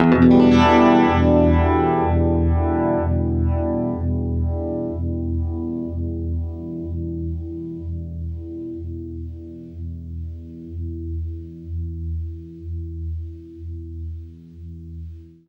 UNIVIB EM7, guitar; East West; Steve Stevens Guitar Samples Collection

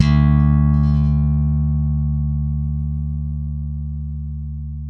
SLAP D.3, BASS; Spectrasonics; Bass Legends CD 1

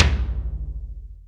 DRY TOM; Back In Time Records; Big Bang

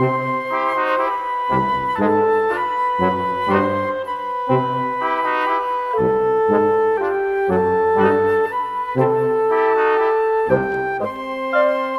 Brass Winds; Sonic Foundry (Sony Creative Software); Orchestral Series VOL-3; Cinematic 22

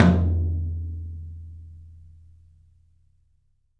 TOM, Studio Toms; 3D Percussives

